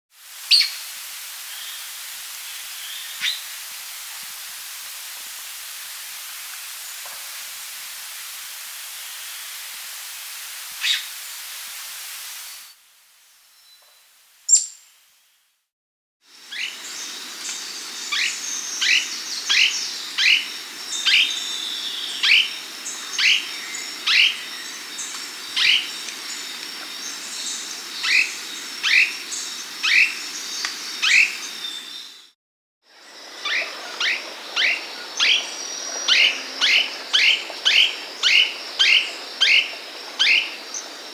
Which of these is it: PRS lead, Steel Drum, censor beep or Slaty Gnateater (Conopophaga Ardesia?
Slaty Gnateater (Conopophaga Ardesia